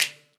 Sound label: Percussion; Vengeance Samples; VEH2